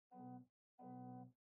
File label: Air Conditioner Far Angle